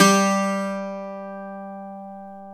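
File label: bouzouki G 1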